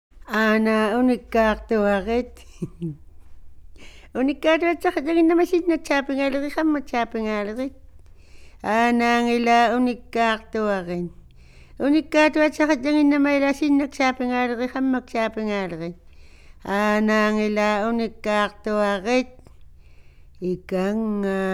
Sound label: Tickling Song, INUIT